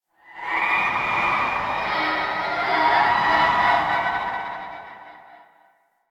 Void Connector Shriek One Off, Ambience; Warframe; Citrines Last Wish

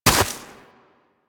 Walk Snow, Footsteps; Warframe; Operator

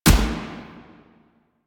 Assault Rifle Fire; Weapons; Warframe; Grineer

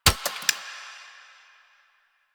Shot Mech, Weapons; Warframe; Acceltra Prime

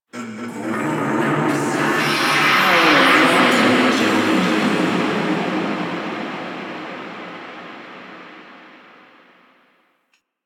Cave Echoes; Ambience; Queen; Warframe; The War Within; Golden Maw

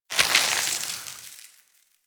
Loot Corpse

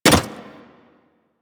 Hyena Micro Step Default, Footsteps; Warframe

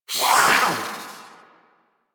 Feral Vocal Attack Medium; Catbrow; Warframe

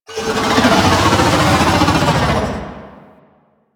Baby Dragon Vocal Idle; Enemies; Warframe; Duviri; Void Dragon

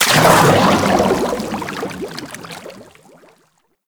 boost thruster; Warframe; Sharkwing; Vehicles

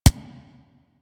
Weapon Punch SMG

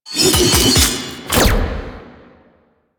Sword Finisher; Warframe; Quests; The New War; Teshin

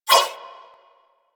Nunchaku Whoosh; Weapons; Warframe; Tenno

